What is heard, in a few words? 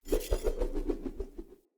Throwing Knives Projectile